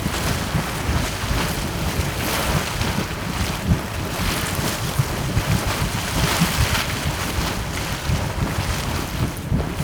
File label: Water Jet Platform Splash Loop, Waterfall; Ambience